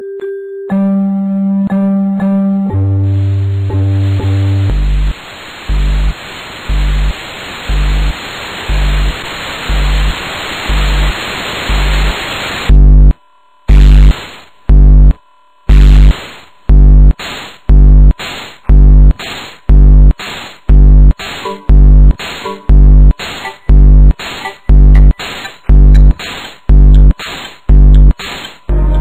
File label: Windows Noises